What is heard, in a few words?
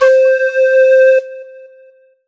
Roland PN D10 Indian Pipes C5